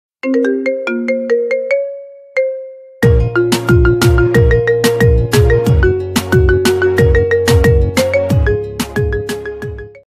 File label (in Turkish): telefon zil sesi marimba remix